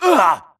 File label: grunt hits; Titanfall 2